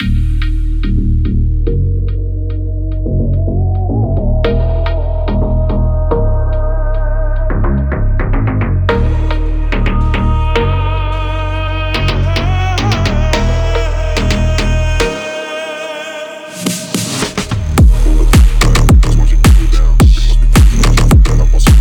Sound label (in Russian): Мощные басы, PHONK; басовый Phonk; фонк музыка